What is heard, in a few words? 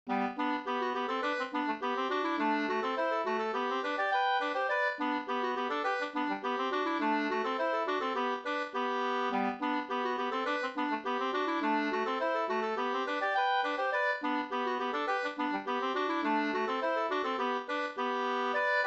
polka polkett; e Sahlstrom Up NSG157 2st